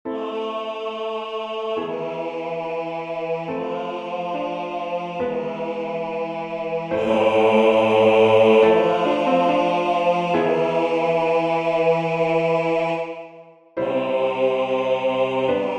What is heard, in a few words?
Adoramus Te Christe bass